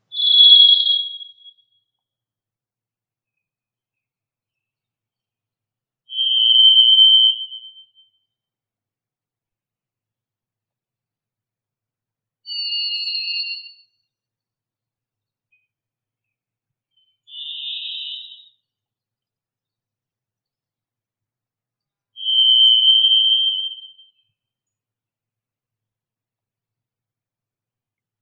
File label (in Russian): drozd raznogolosii ixoreus naevius